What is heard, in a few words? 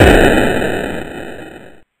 boooon; LcdDem SFX